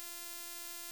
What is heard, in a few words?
Death State Enter; Warframe; Tenno